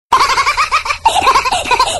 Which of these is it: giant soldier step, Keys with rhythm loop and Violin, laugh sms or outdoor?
laugh sms